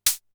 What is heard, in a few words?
hh, Polivoks; Formanta-Radio-Plant Polivoks; 300 Drum Machines